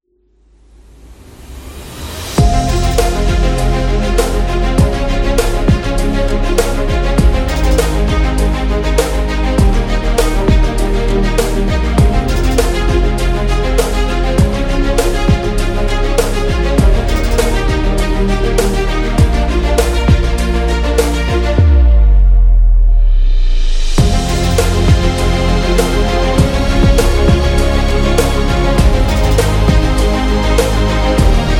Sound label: Epic Hip Hop Trailer